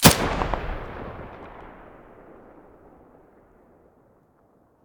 muzzle mono

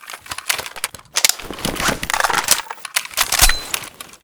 reload empty, weapons sks

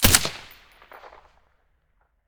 scar silencer mono

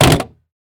pistol drop wood; STALKER; IWP

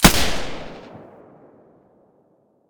silencer g36 stereo, weapons

